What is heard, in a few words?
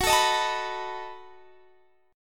B 7th Gb